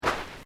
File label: Footsteps Boots Sand Step; Footstep Foley; Human